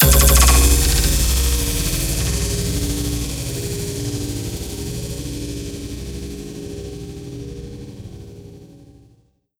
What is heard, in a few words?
Riser Rev Faling